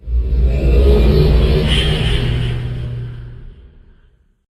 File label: teleport